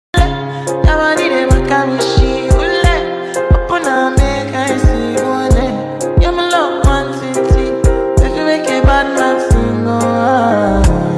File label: Ah Ah Ah Ah Ahhh Song Ringtone; ringtones